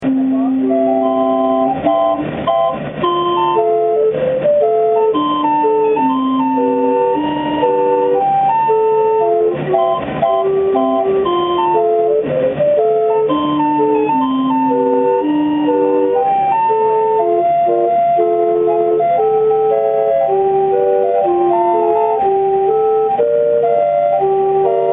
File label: calliope; spirit of peoria